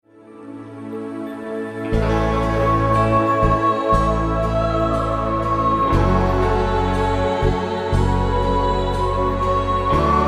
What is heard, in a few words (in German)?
Abendlied